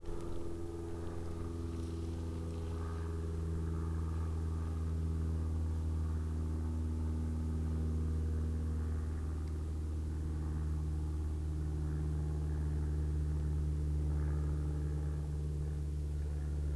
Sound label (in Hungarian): gimszarvas motoros repulo; budakeszi zoo 2010